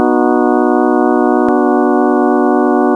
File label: sine just